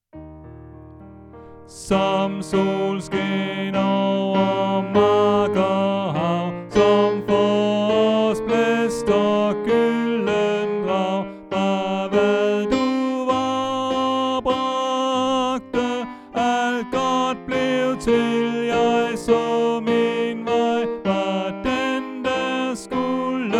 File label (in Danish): Tenor; oevefiler